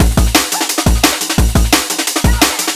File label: West Coast Beats 174, breakcore breaks